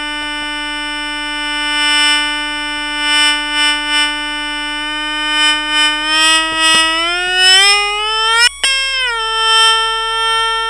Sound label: synthesizer